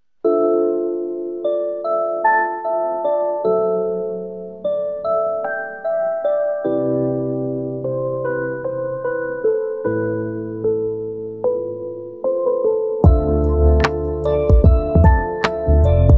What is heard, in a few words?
rainy lofi